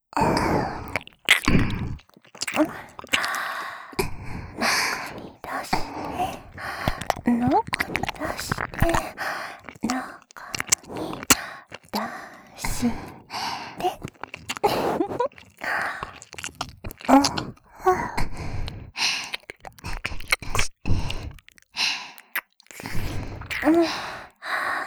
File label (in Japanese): 中に出して 耳舐め